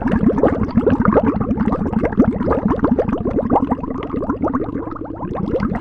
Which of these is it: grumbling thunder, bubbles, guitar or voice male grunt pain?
bubbles